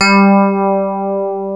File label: TINE PIANO; E-MU Formula 4000 Series Vol. 3; Analog Odyssey